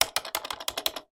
office keyboard apple back space delete press multiple times; sound effects one